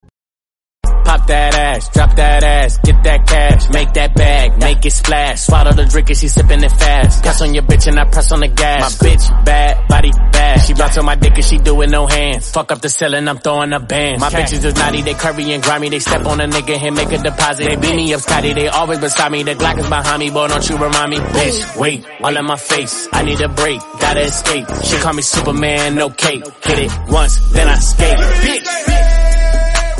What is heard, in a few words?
rap song